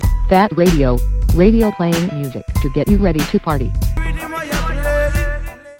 ids beatz; radio station ids for my online radio station

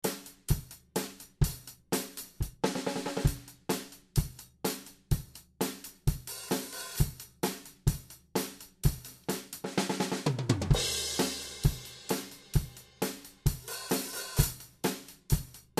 drum sample

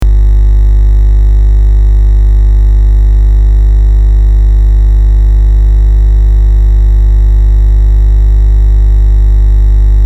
noizz turntable; record vinyl in to my computer

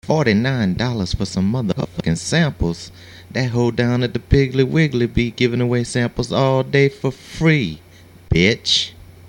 samples, hip hop r b drum samples

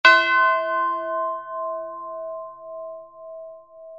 c bell

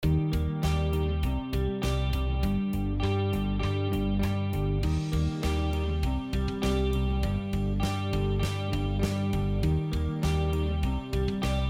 clean, bass guitar through a guitar amp

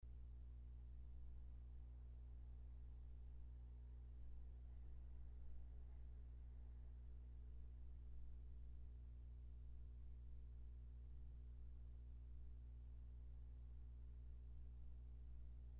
hum, low end rumble in mic

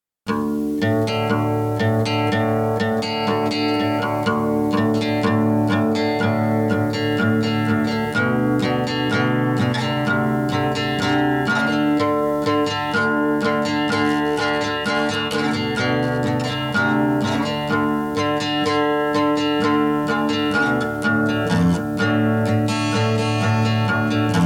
acoustic guitar

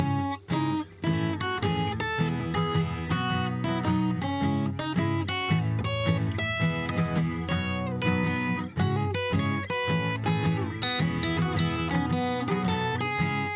acoustic bus

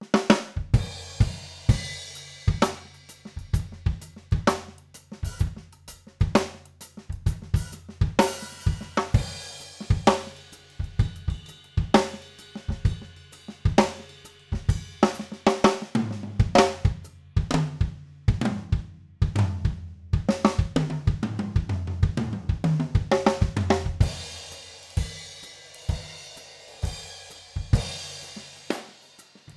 OH Spaced Card HPF, overheads, drum

